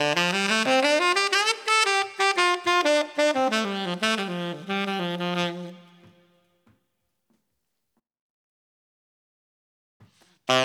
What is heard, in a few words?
In A Country Stylee G Maj 16beat 90